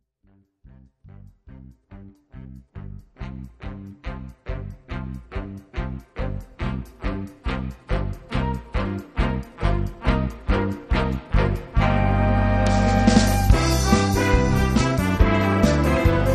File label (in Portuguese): orquestra bossa jazz